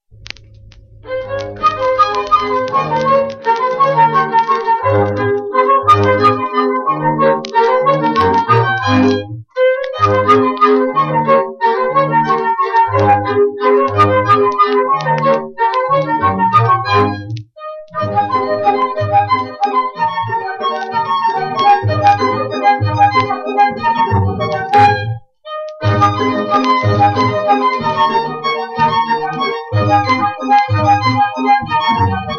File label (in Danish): vals, kvartet; stine anders lausens vals